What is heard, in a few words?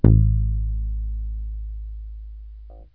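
guitar pluck; PC Musician 2000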